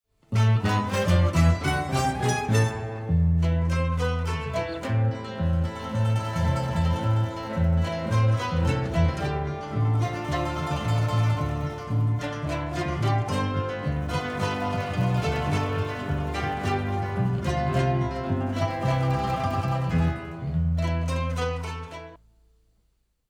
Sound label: mandolin